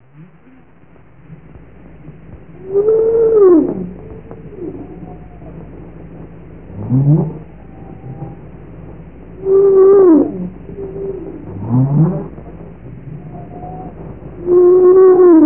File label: humpback, whale songs; teaching physics